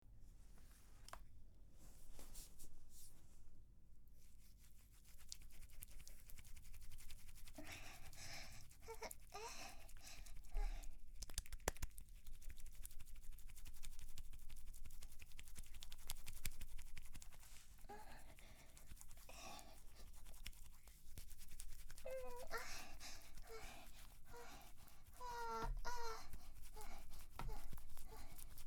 クチュクチュ音

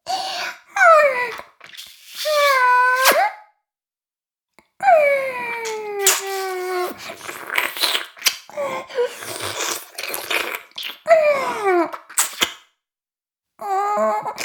フェラ音声, ASMR; 妹

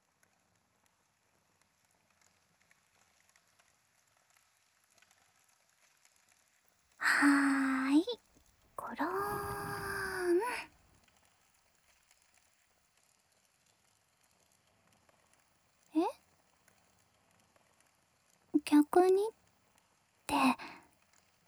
左耳を綿棒でお掃除

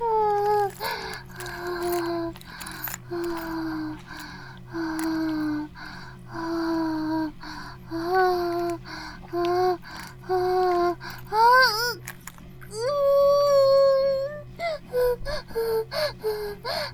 バイブ放置; イクたびにわけて

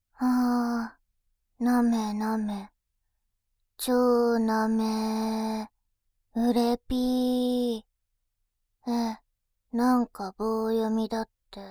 耳なめ

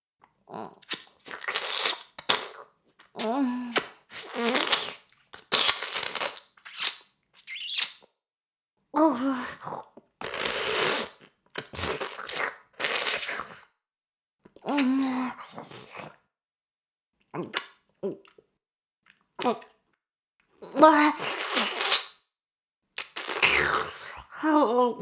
フェラ音声; 牧場戦腺; 少尉

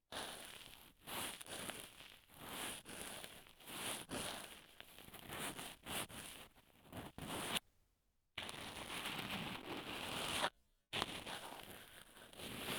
耳かき L（摩耗紙ヤスリ＋乾燥綿棒）; 夏実の耳かき

